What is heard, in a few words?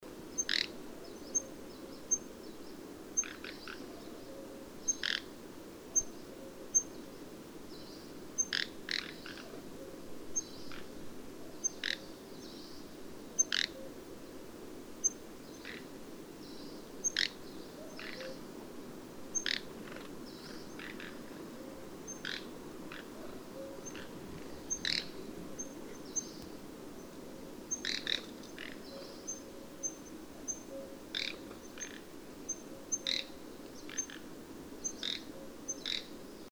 Phoenicurus erythronotus call; passeriformes; muscicapidae